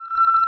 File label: beeps misc